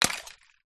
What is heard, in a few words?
beer bottle full drop concrete ground smash